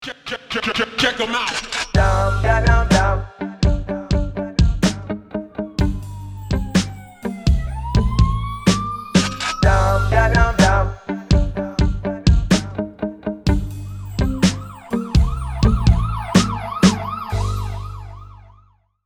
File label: Busted thug life wasted police; social media